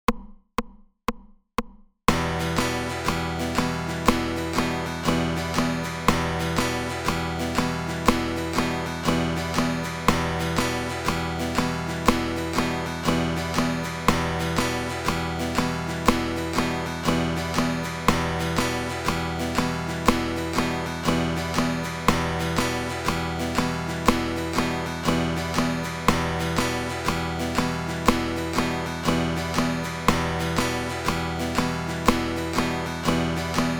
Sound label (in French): croches sautées 120